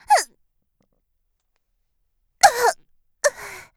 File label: die; Eternal Return; Tia; Voice Line; KOR